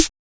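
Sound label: CR-78 SNR, snare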